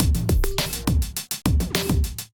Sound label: Animal Kit groove 103 bpm, Drum Essentials; MIDI Clips; Ableton